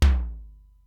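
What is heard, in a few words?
Simmons SDS-9 low tom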